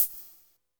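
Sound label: hi-hat, drums; Best Service; Real Mega Drums VOL-1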